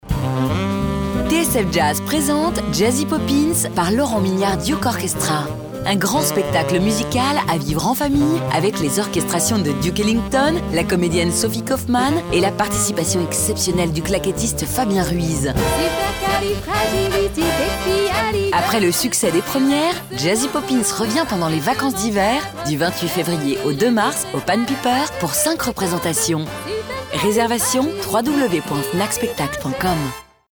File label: Jazzy; Poppins